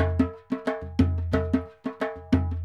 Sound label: Dholki Loops